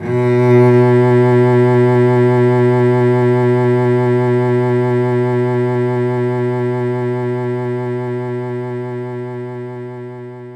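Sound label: Cello A sharp 1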